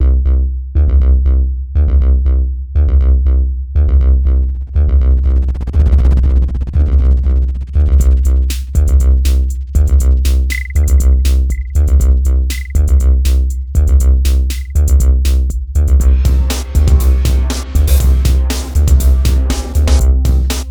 Simple Beat

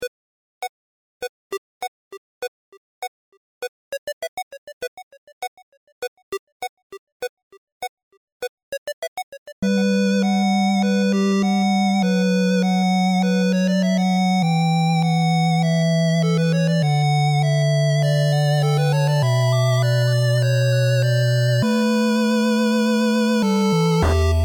burned town

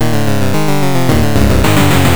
Boss intro (8-bit)

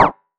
silencer

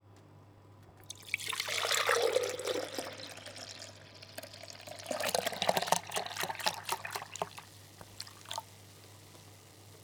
pouring milk, close up

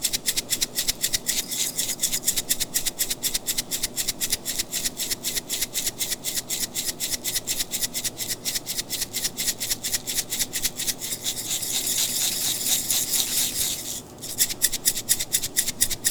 shaking coffee grounds, household sound effects

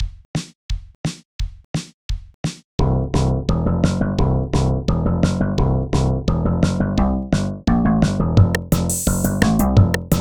loop city

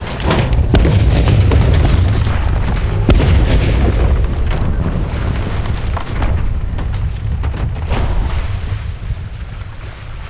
ship destroyed

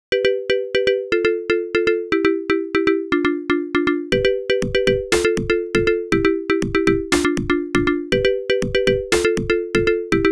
upbeat

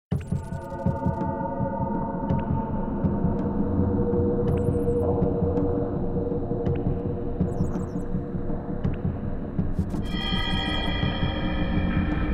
Caves

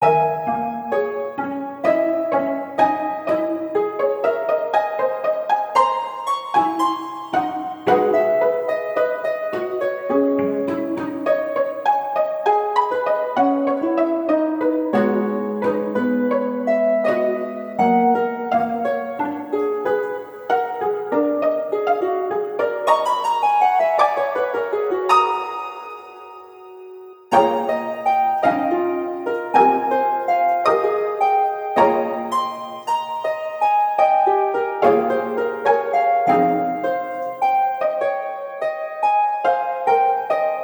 Christmas Special (Instrumental); Christmas Special 5 (Instrumental

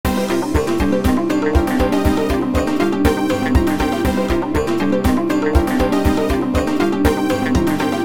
Theme

